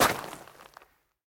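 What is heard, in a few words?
destruction of a huge stone with a kick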